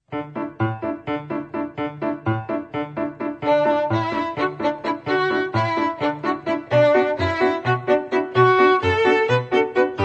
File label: Toccatina